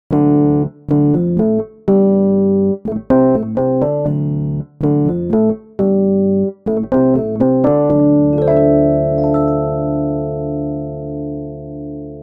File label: intro dry